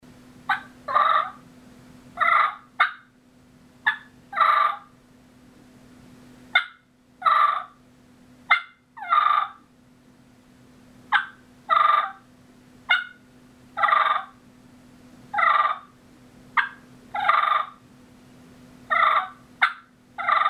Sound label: Cluck And Purr Sequence, turkey